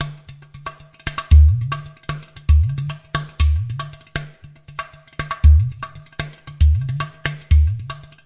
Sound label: 116 tricky udu, drum loops and breaks